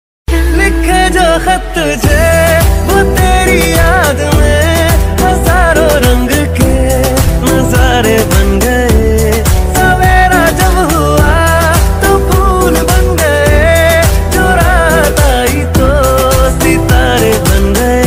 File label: Love Ringtone Hindi Ringtone